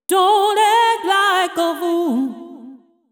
deep house female, 125, A, vocals

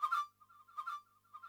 FLUTE ETHNO 160 C

